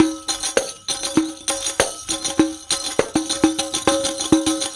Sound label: Tamborine; Ambient Grooves; World Groove